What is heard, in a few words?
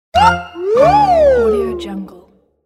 cartoon whistle